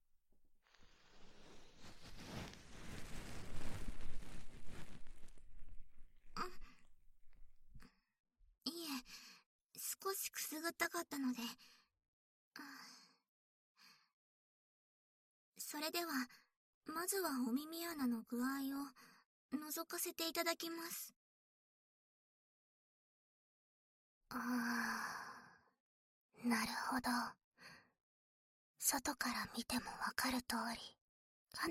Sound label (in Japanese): 右 浅め耳かき